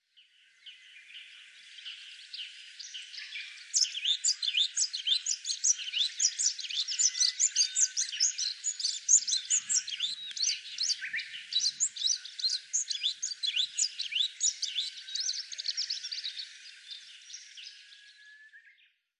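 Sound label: Bull-headed Shrike, nikkou; mozu; koe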